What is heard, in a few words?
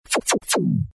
Laser